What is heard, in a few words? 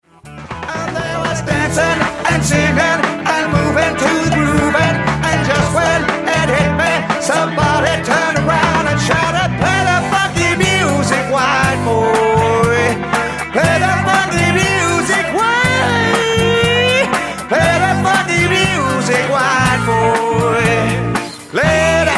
FUNKY MUSIC